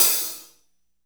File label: HAT SLOSH; 90s sample CD